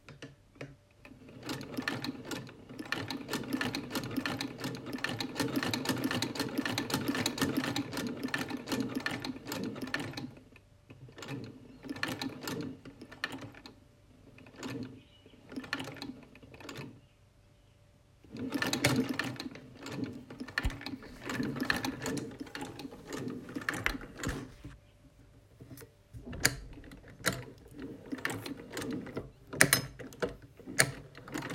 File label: Sewing